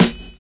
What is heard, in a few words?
KLAXON; Univers Sons - Kitch 70s